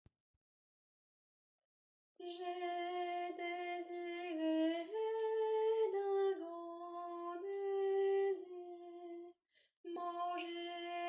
Alto; J'ai désiré

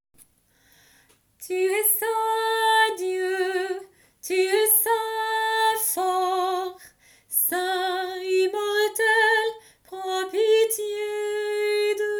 Passion Acclamation tu es saint dieu

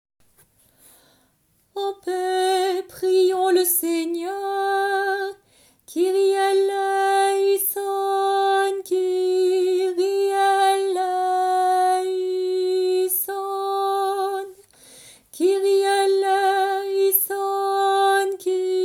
Priere Intercession; Vigile

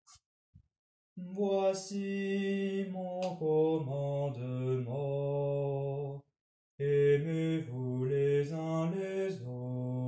Basse

